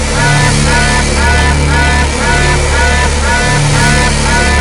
empty

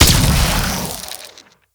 plasma shotgun fire

